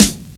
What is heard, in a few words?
Prominent Urban Snare One Shot G Key, snare drum sound tuned to the G not; snare drum samples